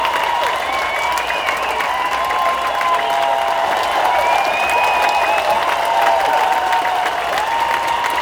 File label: FANFARE; RallyC; AMBIENT